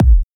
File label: KICK, DRUM HITS; Loopmasters; The New Sound Of Dubstep